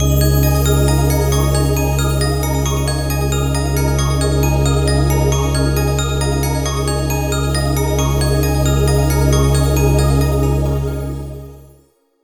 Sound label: Jingle, All Panels Complete; Puzzle Swap; streetpass mii plaza; 3ds